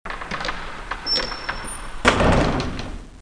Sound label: WOOD DN; Renaissance